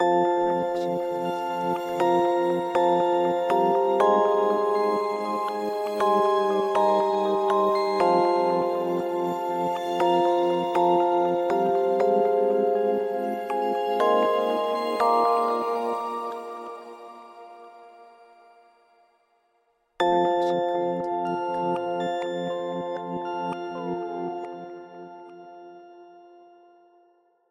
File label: Ident Shimmery Bells; SoundsCrate